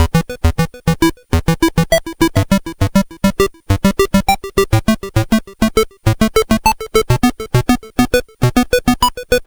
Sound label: Game Boy Bass FX; 8-Bit; Chiptune; Nintendo